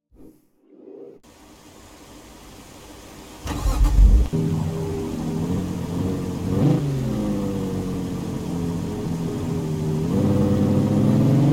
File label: saab 9-3 turbo x; 2008; TDU-1